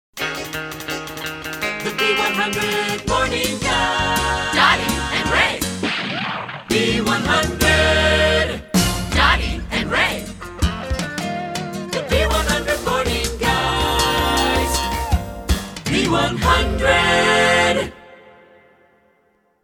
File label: Jingle; Short Attention Jingle Show III